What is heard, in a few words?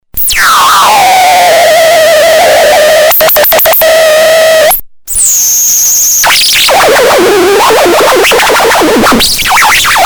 Crackle Box